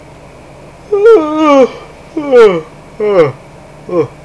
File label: YAWNING